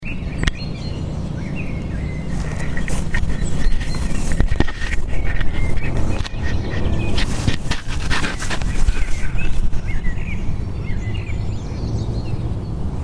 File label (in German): seegeraeusche